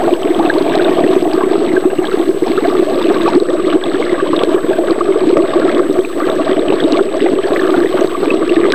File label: dry ice sound